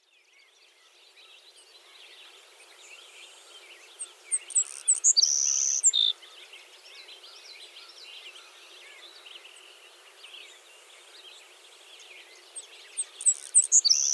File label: Savannah Sparrow 12 Songs